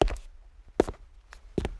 kroky; Mafie 1

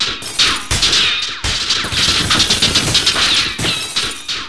odrazene kulky; Mafie 1

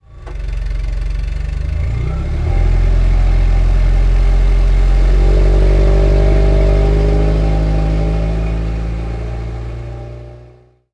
auto couvani; Mafie 1

